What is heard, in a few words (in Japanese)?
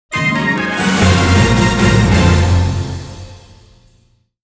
レベルアップ!; Metal Saga Kouya no Hakobune